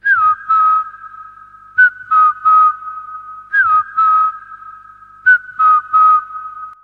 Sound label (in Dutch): fluitje